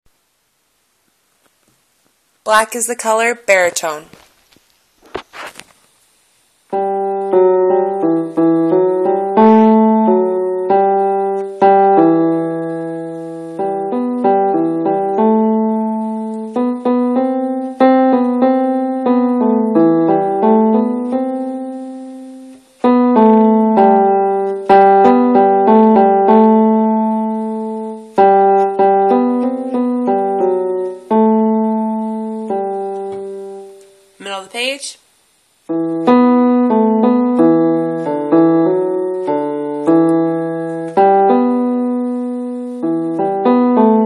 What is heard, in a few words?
bari piano